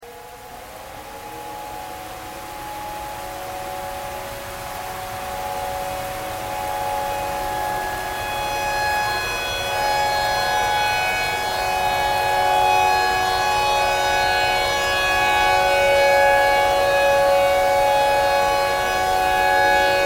tornado siren

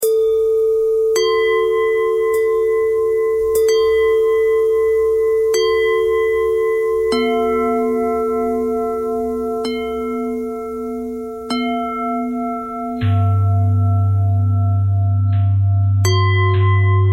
bell threads; bells

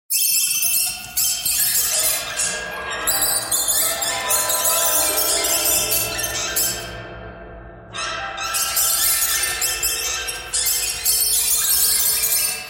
birds thread pentatonic

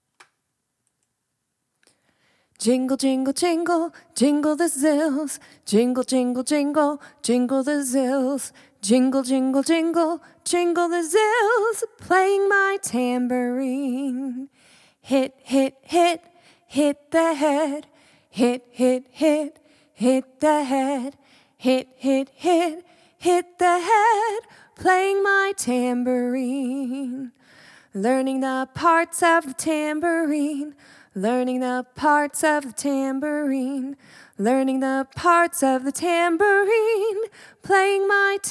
playing my tambourine

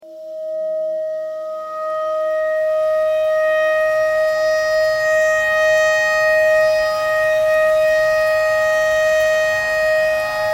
tornado siren clarinet notes e pentatonic

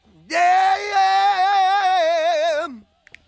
yeahiiyeaaah

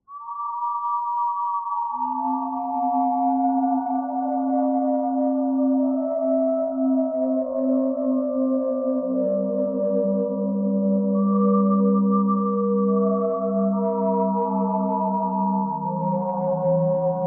bird theme buzz

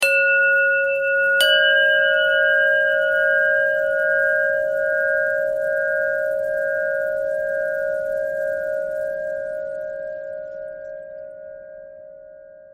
bell thread bend thread; bells

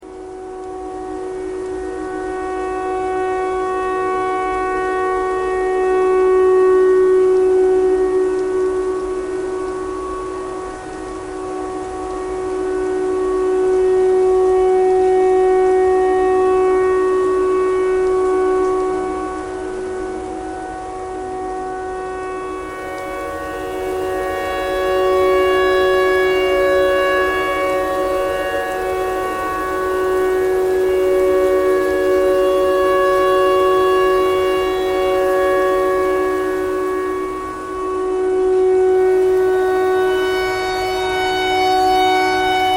tornado siren tornado siren thread simple

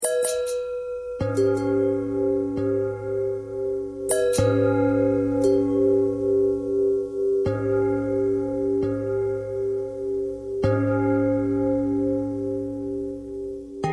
bell long bell thread simple, bells

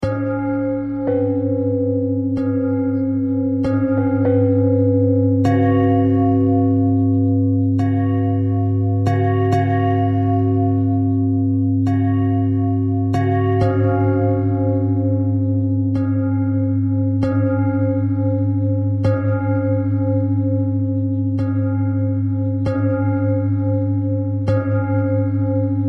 bell bell thread simple, bells

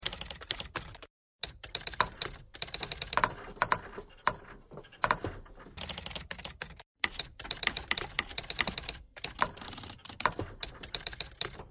typewriter thread pentatonic

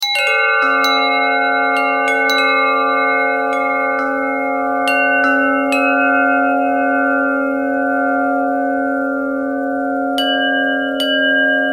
bell thread

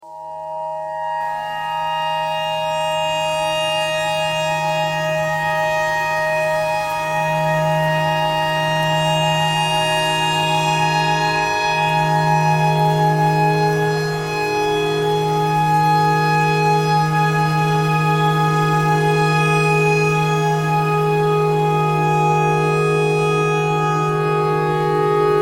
tornado siren clarinet notes e thread pentatonic

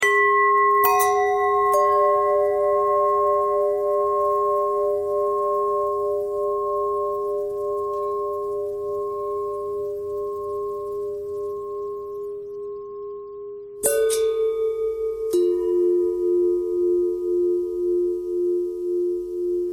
long bell bell thread pentatonic, bells

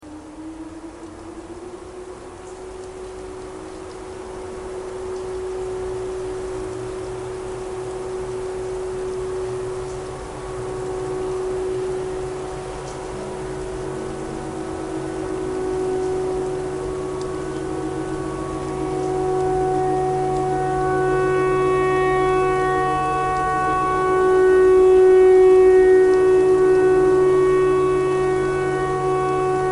tornado siren

